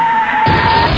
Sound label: crash